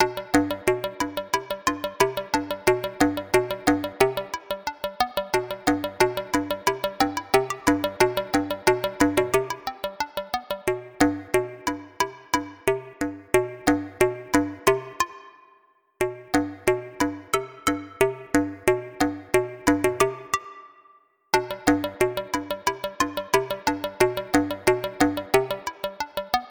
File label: Palm Pipes